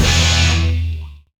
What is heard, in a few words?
guit venom hit (E); Sonic Foundry (Sony Creative Software); Ugly Remnants VOL-2